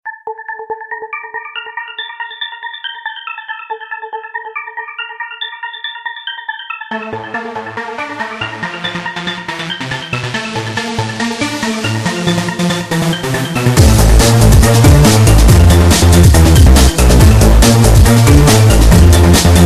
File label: Metallic